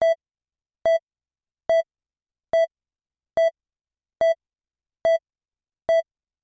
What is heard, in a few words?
electro cardiogram